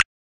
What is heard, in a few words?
btn click